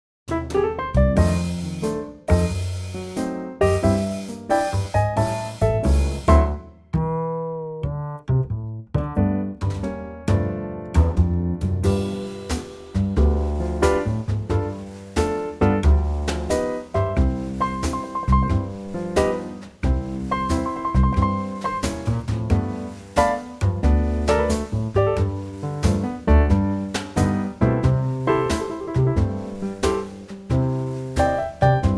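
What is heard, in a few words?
C Trio, backing track; key - C - vocal range - B to D; Here's a super old ragtime gem in a great Trio arrangement.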